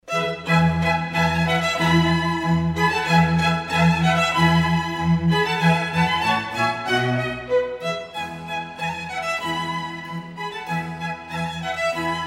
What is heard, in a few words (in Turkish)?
SANTRAL MÜZİK